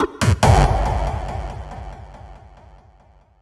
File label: Fill 140 BPM